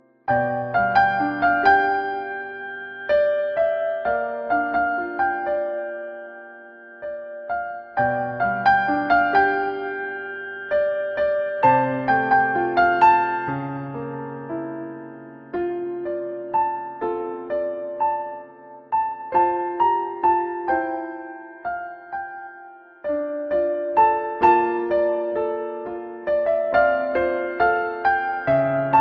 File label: sad feeling cool ringtone